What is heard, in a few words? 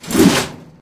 Loading Transition Beginning; Food Truck Game